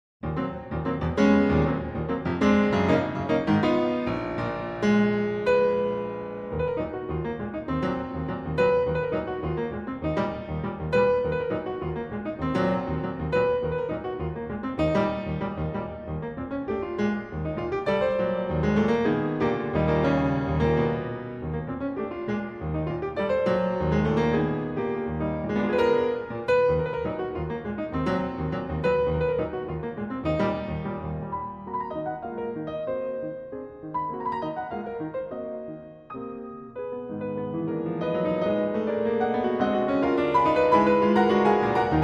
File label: Prelude